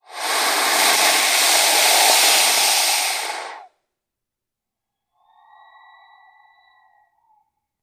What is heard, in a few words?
Python Burmese Hiss